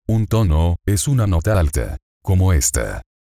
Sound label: Tone Target